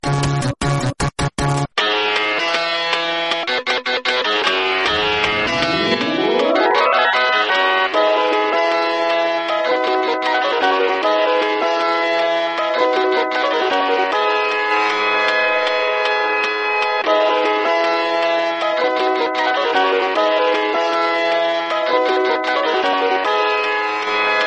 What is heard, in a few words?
coolest 80s arcade